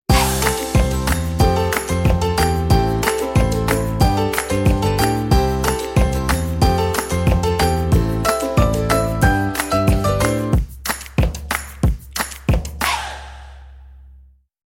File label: Happy Upbeat Folk; MIUI14 and HyperOS 1.0